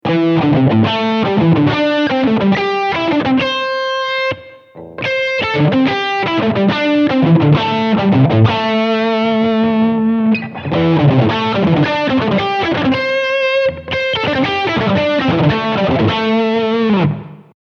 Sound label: String Skipping